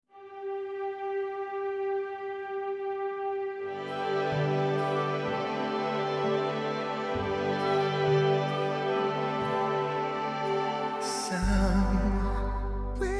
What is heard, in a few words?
SINGERS